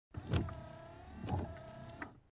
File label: Windshield Wiper